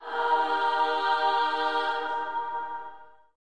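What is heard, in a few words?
Slavic Choir E flat Aah; Slavic Maybe Bulgarian Choir; VOCAL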